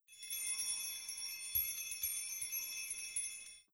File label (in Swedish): Jul klockor